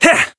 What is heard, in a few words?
Voice attack